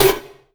Sweep Oneshot; Sonic Foundry (Sony Creative Software); Esoterik Beatz

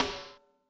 Distort, Yamaha DTXpress-II snare

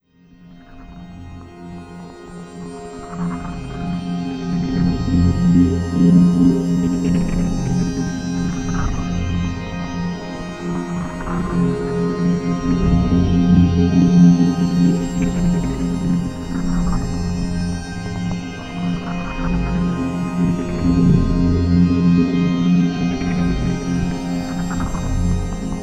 Textured Click; Arps & Clicks; Sonic Foundry (Sony Creative Software); Slow Silhouette

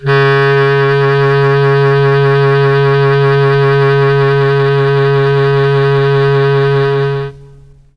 C#2 clarinet, Mellotron; Mike Pinder - Mellotron Archive CD; CHM CLARINET

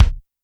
kick, Roland R-70, BD

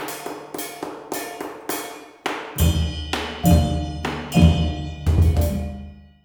MALAY PHR L, Heart of Asia

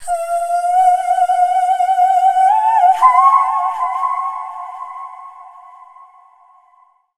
Dance Vocals, FEMALE

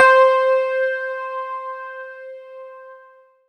C5, Synth Line; NiceBeats

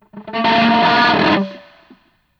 GTR, GUITAR FX

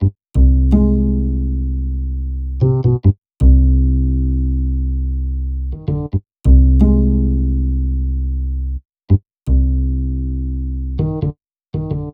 Upright Bass, Cinematic Funk; Sonic Foundry (Sony Creative Software); Cinematic Funk Urban Tone Poems

